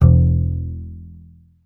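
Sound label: contrabass E; Miroslav Vitous; Solo Instruments VOL-2; Solo 2 Bank 1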